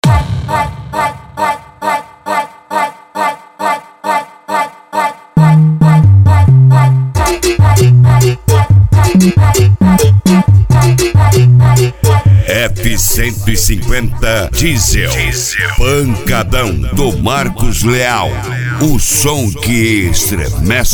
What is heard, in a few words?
pancadao